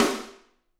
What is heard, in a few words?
SD, Drums; Bob Clearmountain Drums I